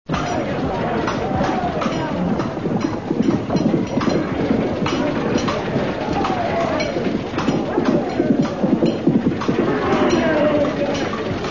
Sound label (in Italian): armi